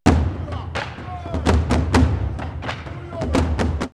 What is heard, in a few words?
Burundi 128, drumloops World Ethnic; X-Static Goldmine